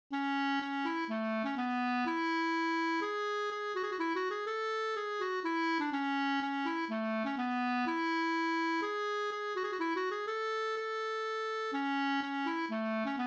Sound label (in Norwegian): pols; Fersdalingen no